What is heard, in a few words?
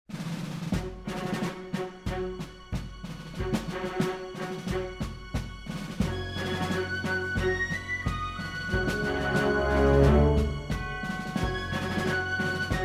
Music; Age of Empires III; cinematics